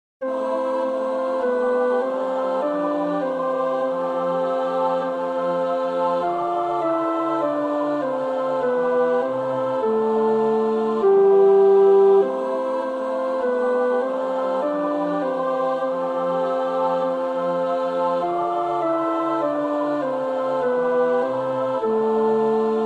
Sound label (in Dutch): Onze Vader (tenor); Sneep (Peter); Onze Vader (S-A-T-B)